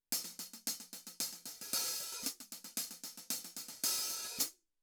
14 HH CLOSE OPEN, cymbals

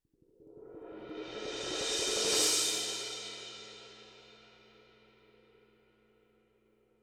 18 CRASH SWELL, cymbals

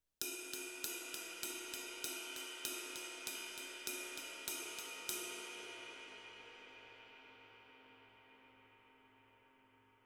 RIDE ROCK

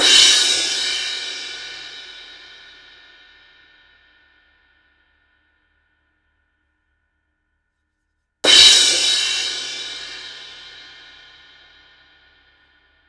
Crash, cymbals

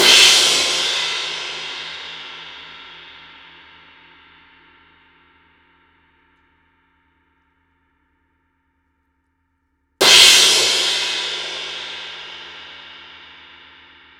X-Series Crash, cymbals

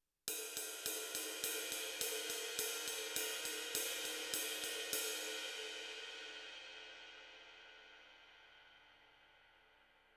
21 RIDE ROCK, cymbals

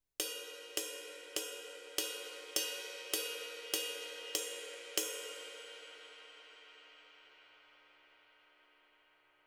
21 RIDE BELL, cymbals

